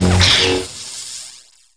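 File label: Light Saber body, Contacts avec d'autres sabres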